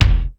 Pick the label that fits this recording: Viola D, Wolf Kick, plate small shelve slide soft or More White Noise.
Wolf Kick